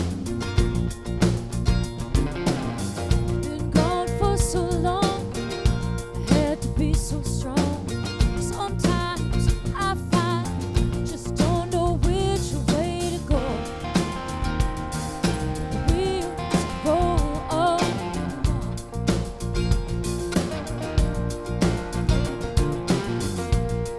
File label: drm stereo enhancer